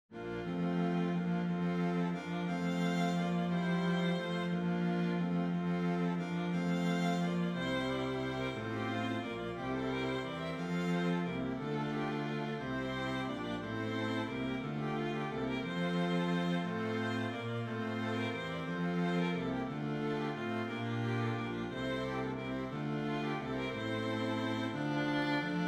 Hymn Strings

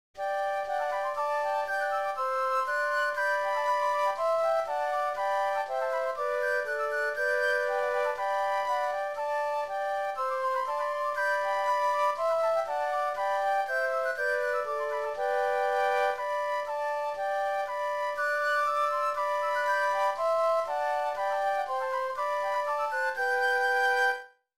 Hymn Flutes; Hymn 288

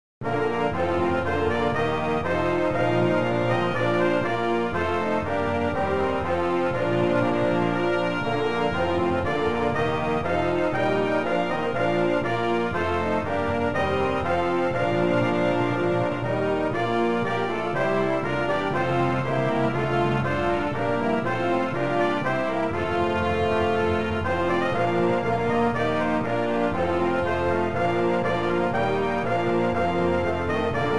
Hymn Brass

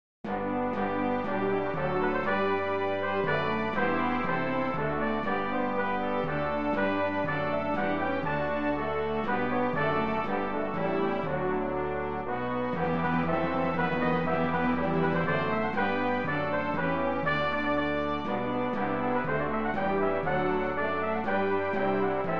Brass

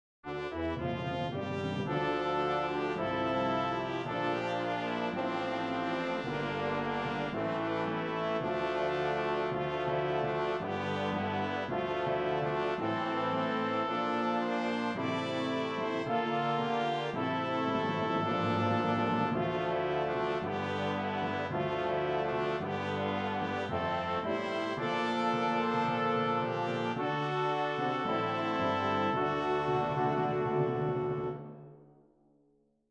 Brass; sanctus s129

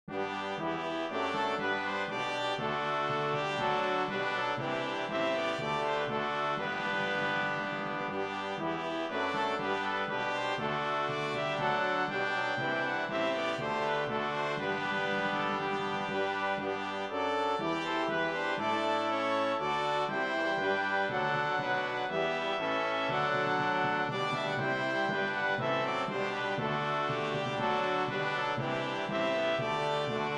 Hymn BRASS

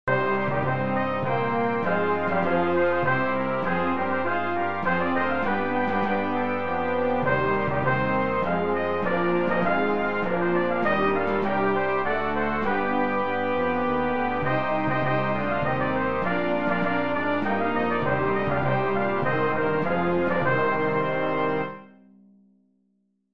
Hymn Brass

